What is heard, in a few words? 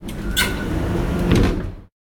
door open; Golden Crayon Sounds